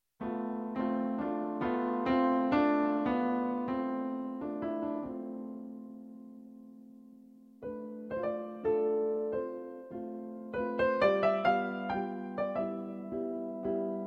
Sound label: Mazurka